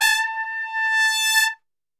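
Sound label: A 3 trumpet; Best Service; Brass Super Section; TRP SWL; 90 s Sample CDs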